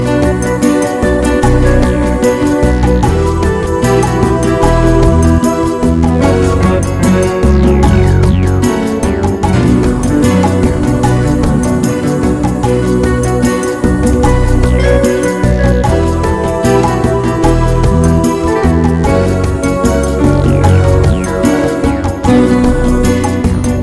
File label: Slow and Beautiful Guitar